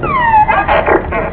R2 BEEP

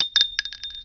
SHELL

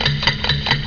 TICKS

